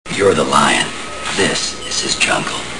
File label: jungle; "You're the lion, this is his jungle."